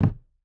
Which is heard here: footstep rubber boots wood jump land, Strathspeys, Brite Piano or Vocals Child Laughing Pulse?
footstep rubber boots wood jump land